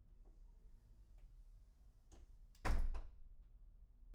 door slam; IEEE AASP

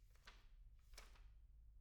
pageturn; IEEE AASP

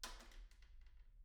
pendrop; IEEE AASP

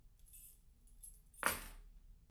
keys; IEEE AASP

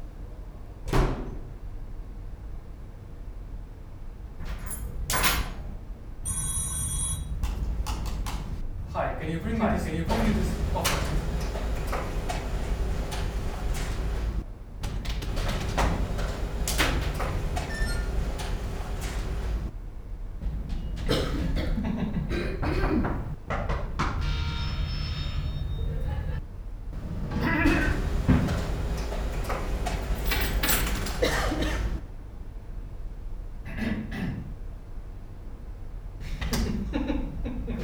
office; IEEE AASP; events office synthetic

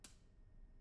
switch; IEEE AASP

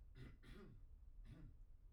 clear throat; IEEE AASP

